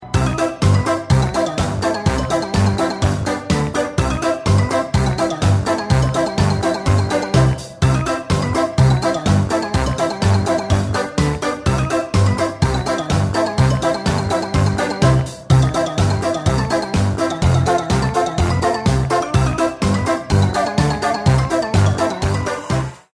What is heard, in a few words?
funny melody cartoonic music tone ringtone